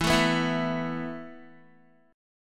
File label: E Suspended 4th E, down, Guitar; strummed